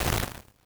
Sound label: rly compressed bat